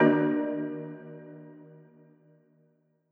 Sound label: Marimba Hit